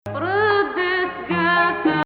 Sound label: Rast Nahawand